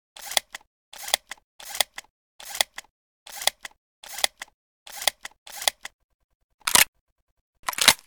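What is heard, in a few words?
reload, shotgun; weapons; BOITO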